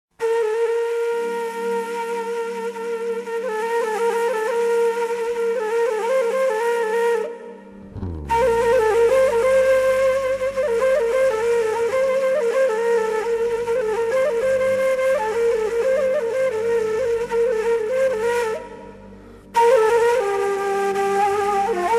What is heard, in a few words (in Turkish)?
kaval